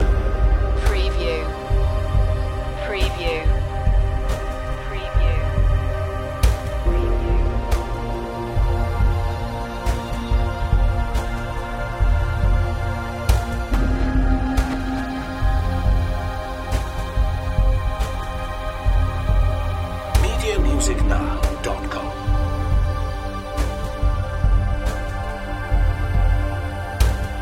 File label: ethnic movements loop